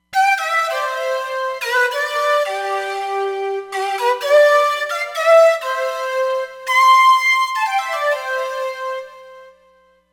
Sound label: Fairy Flute, Roland Fantom XR